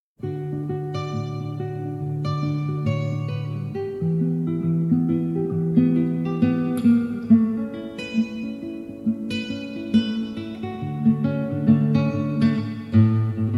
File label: old rythm